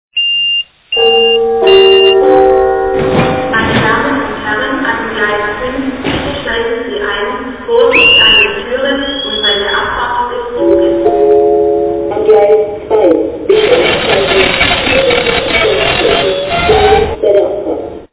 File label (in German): Am Bahnhof